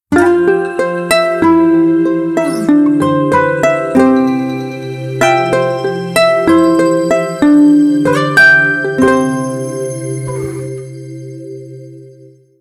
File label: gazing out